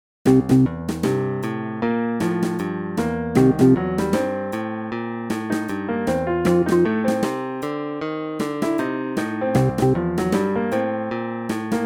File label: MIDI Blues; spellman studio